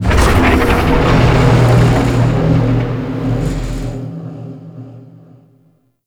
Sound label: Void Dragon Move Start; Warframe; Duviri; Movement